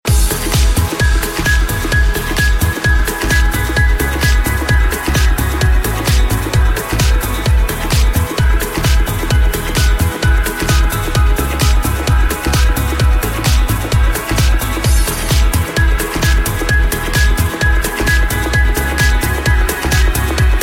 trance ringtone

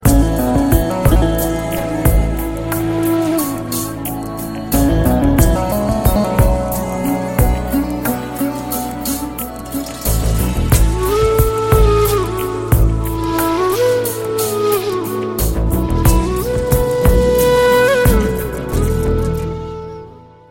flute fresh, ringtone; samsung

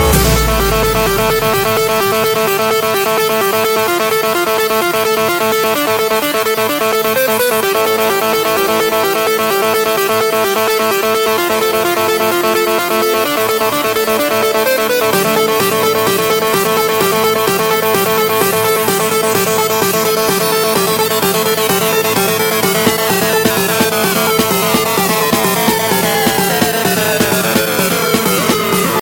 original mix ringtone

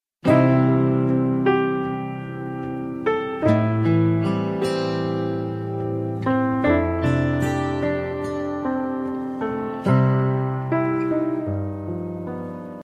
piano ringtone

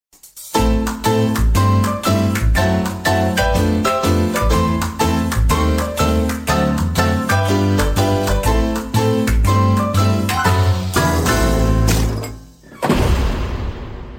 cartoon ringtone; mr bean

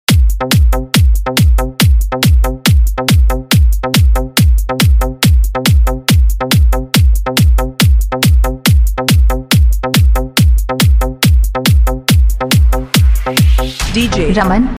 dj sound music ringtone

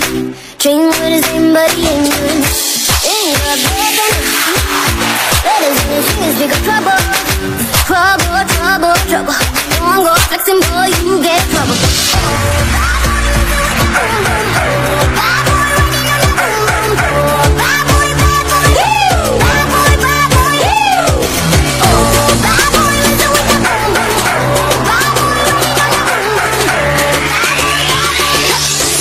english ringtone; english ringtones

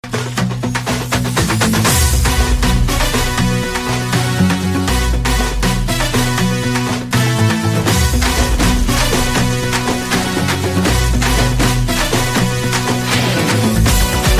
kuthu mass bgm ringtone

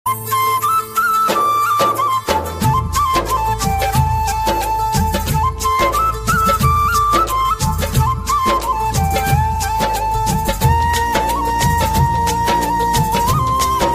most romantic piano ringtone

